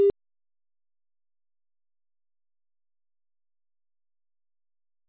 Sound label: Tone On Hold, IP Phones; Cisco; IOS; 7942G; English